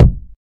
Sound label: strong bottom end bass drum g sharp key